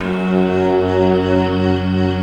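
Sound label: F#2, JAPAN FLUTE; Best Service; Advanced Media Trax Modern Composer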